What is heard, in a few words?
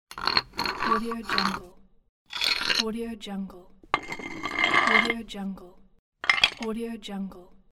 ceramic puzzle lock; game; نصب سرامیک